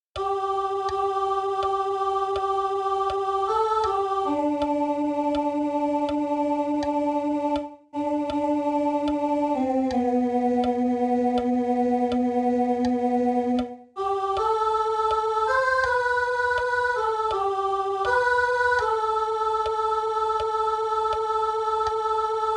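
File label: soprano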